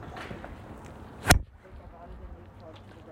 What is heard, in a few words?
Driver